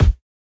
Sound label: Impact Wall; Going Under